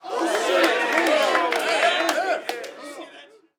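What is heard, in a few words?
Street Ball Crowd Cheer Medium; Crowds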